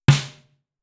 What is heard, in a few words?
Snare, Drum Machines DDrum-AT Pitched Down